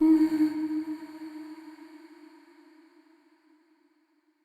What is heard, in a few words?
Statue Voice D Sharp; Player Instrument; Statues